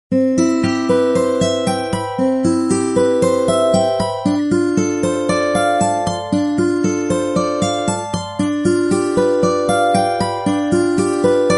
acoustic guitar alarm ringtone

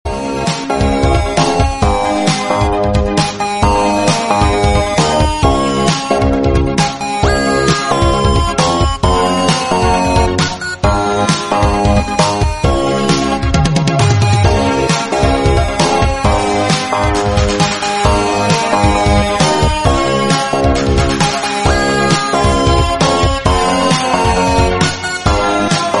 Instrumental

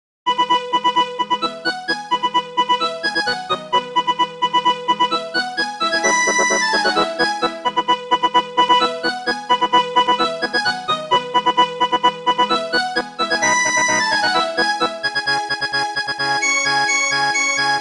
Nokia William Tell

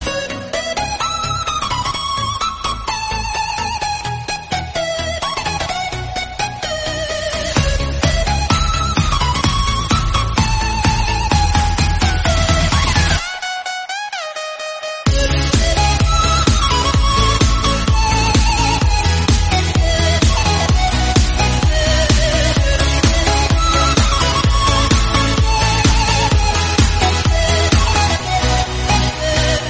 instrumental

